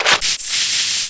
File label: gas clip in